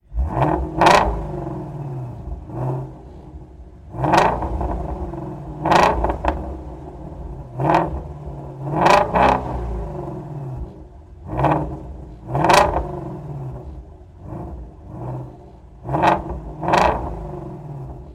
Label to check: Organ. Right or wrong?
Wrong